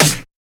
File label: Snare; FLPRO